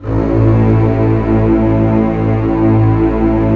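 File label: cellos, forte, orchestra; Roland LCDP13; STR Orch. f Slow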